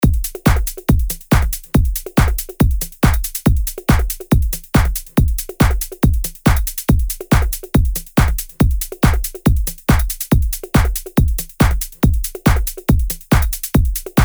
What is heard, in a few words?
DRUM LOOP DANCE 140BPM